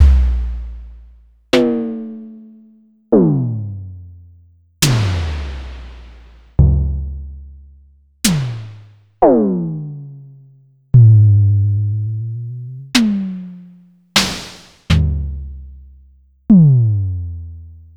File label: Pearl Drum-X, Samples Sliced; m8